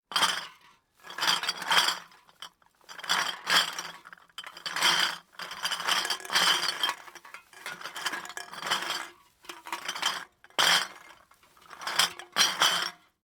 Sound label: bottles empty close, household